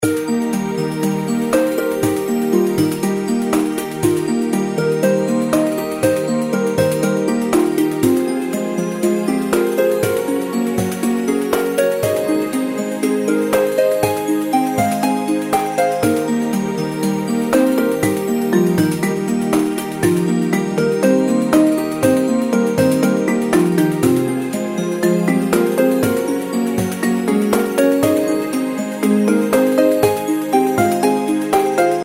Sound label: snow leopards reelspin fg